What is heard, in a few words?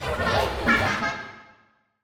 Homunculus Skinning Roar